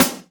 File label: SD, Dance Drums; Best Service ProSamples vol.15; AKAI